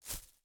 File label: Footsteps Leaf; Main Character